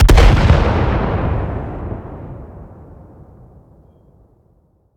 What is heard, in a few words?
combat atmosphere; World of Tanks